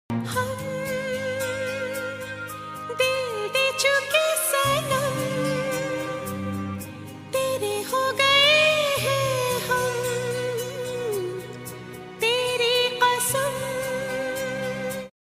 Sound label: hindi ringtone